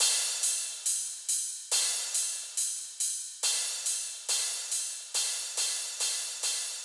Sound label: Riddim Drum Loop 140 Top